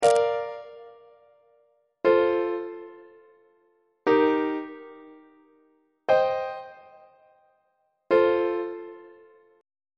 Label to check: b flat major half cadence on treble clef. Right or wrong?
Right